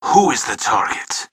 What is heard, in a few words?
battlecry; tf